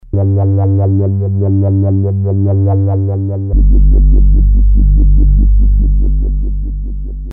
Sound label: subbass